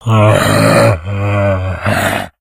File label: death, infected male; left4dead; Source engine